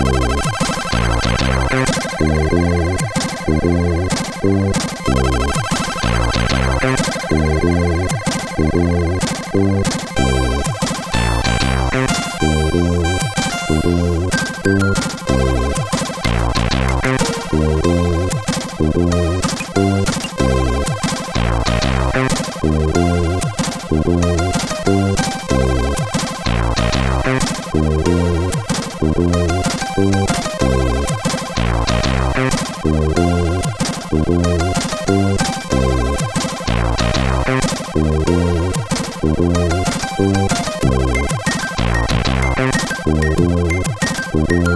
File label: CSG8580; Notezak